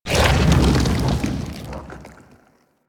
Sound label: Purification Machine Buried Spawn; Warframe; Nineteeen Ninety Nine; Infested Survival